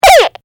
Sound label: Walkie Talkie Chirp SciFi, Walkie Talkie Chirp Static Blast; Warfare; Chirps (Sound Effects:Multimedia)